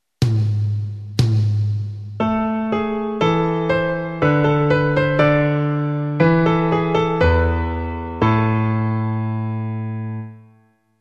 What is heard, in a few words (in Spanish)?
2 voces